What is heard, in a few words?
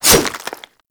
Infested Charger Swipe